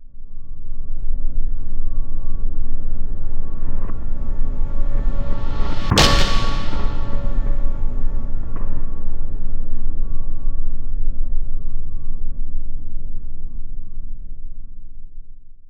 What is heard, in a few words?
Boom Crack; RVRS; Cinematic Metal Impacts